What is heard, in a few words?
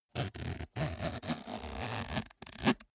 zipper small case close slow